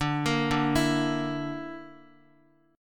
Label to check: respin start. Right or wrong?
Wrong